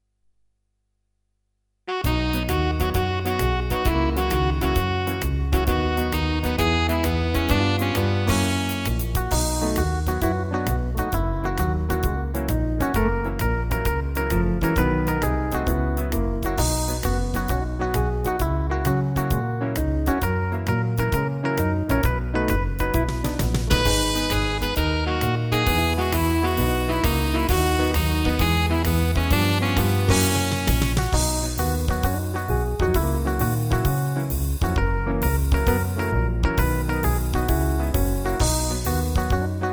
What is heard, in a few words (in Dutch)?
karaoke; groterekendag 2010